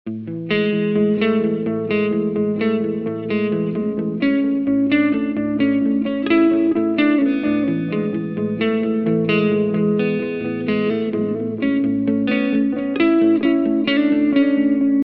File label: relaxing guitar loop